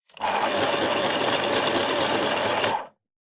Pencil Sharpener Sharpen

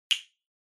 Castanets

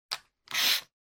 Cutter Cut Paper

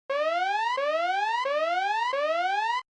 Warning Siren, Low Mid

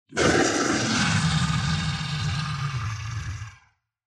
Monster

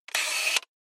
Camera Lens

